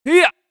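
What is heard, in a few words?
attack voice; Seer; GamigoNA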